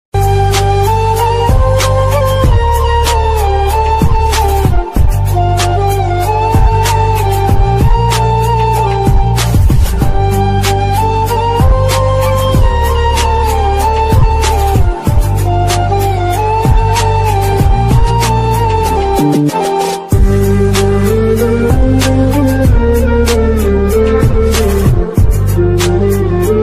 Sad Instrumental Music Ringtone Romantic Sad Ringtone